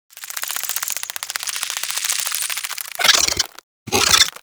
Helminth Interact Mouth Roomba Move Speak; Liset Alchemy Lab

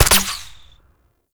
Tranquillizer Rifle Shot; Warframe; Weapons; Tenno

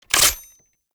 Rocket Launcher Reload; Warframe; Weapons; Tenno; Lasrian

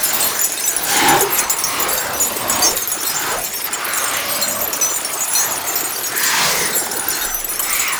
Catenach Chain Latch Loop; Roathe Summon; Warframe; Tau

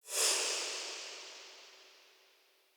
UI Menu Exit; Avakot

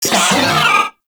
Moa Vocal Pain; Warframe

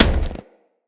Crewman Footstep Walk; Characters; Corpus; Crewmen; Footsteps

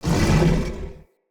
Corrupted Battle Dog Attack Bark; Animals; Vocals

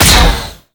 Shot, Weapons; BE Tetra

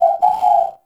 Owl Idle Chirp; Warframe; Duviri; Glyph Puzzle

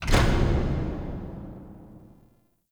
Temple Door Close; Warframe; Harrow; Red Veil Temple

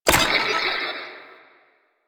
Play Next Video; Warframe; NineteenNinetyNine; Stage6TheCatCameBack

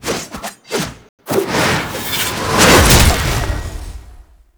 Jump Attack; The New War; Lua Valley Ext; Aerolyst; Mag; Cinematics

